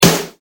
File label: snare